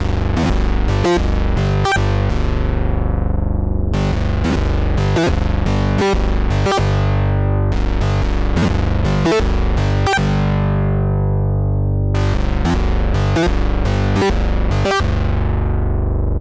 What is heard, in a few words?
LEAD Bass; DIVA preset; MK Bass Marshall